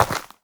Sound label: step, footsteps, player, dirt